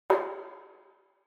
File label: Megaphone Noise Hall Click